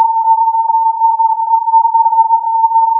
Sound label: filtered input